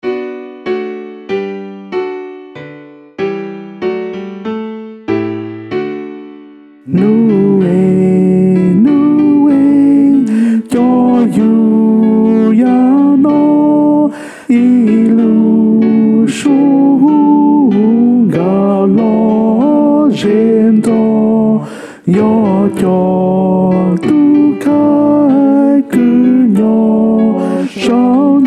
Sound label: tenor